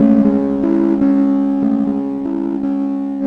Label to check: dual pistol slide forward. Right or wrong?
Wrong